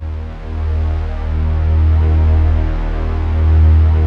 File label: Prophet VS, Vintage Keyboards; Big Fish Audio - First Call Vintage Keyboards